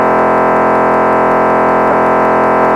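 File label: Moto3600 Type-I Control; First-Generation Motorola Control Channel; This was the 3600 baud data stream used in the first Type-I trunk systems.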